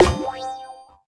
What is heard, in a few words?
Wand Equip; Test Realm